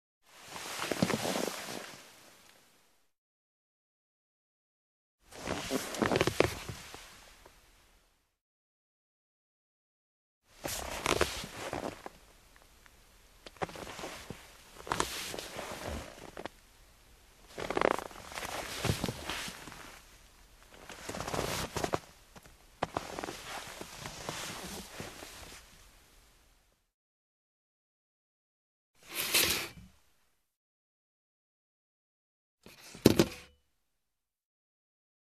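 sit down and get up from a chair, sounds of chair sliding on hard floor, chair falls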